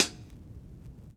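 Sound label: Vinyl Closed Hat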